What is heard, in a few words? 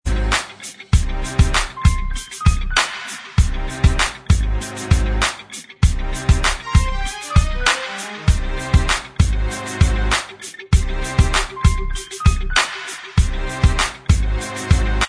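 instrumental, backing tracks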